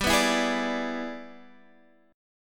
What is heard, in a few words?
E 7th G, guitar; E7/G strummed; JGuitar